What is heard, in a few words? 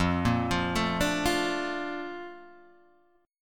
A sharp Major 7th